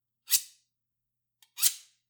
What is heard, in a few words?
Knife